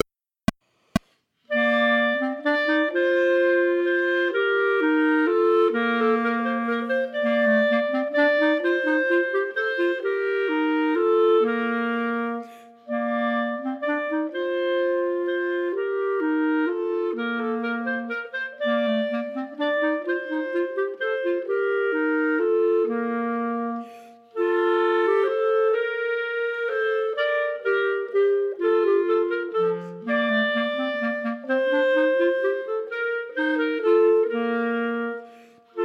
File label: Minuet, Chamber Music for 3 Clarinets, minus 1st part; Partita in F; Chamber Music for 3 Clarinets Vol I